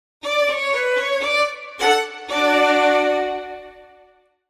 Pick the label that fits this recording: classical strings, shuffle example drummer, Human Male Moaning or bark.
classical strings